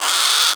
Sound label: Sea Bird Tranq Dart Grunt; Characters; Warframe; Ostron; Hunting Critters